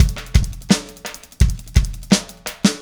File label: Static Beat